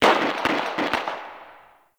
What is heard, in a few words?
Distant Gunfire; Warframe; Ambience; NineteenNinetyNine